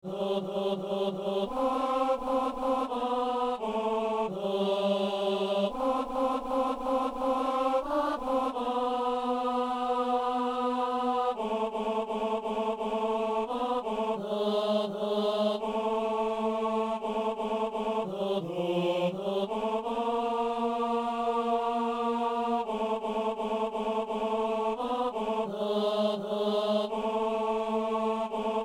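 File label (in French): ténor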